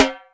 Latin rim, Yamaha DTXpress Acoustic Snare

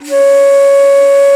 BASS BANS, Bansuris; Winds; E-MU Producer Series Vol. 7 – Old World Instruments

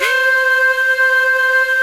JAZZY FLU, JV-1080; Syntec; Wall of Sounds

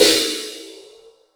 crash R; Zero-G; Chemical Beats; CRASH ZOO

